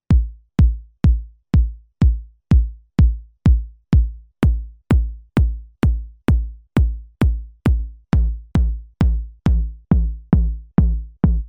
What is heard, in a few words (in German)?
Drumsynth Kickdrum